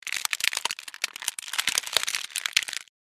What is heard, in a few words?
Chocolate wrapper foil unwrap open; paper sound effects